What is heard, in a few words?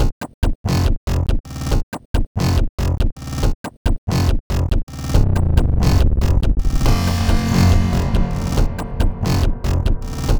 Factory Beat, Some different sounding rhythmic stuff made from synthesized noise.